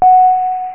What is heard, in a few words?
ping